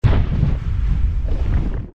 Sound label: trex win; The King Of Dinosaurs